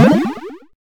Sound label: time bonus; Carnage Contest